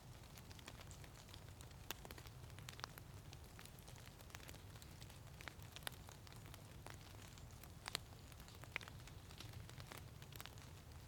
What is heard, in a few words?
Drizzle On Leaves, woods moorland fens